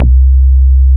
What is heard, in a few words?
Mini bass C2, Mini Bassics Vintage Keyboards; Big Fish Audio - First Call Vintage Keyboards